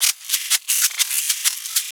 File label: mix percussion loops, 125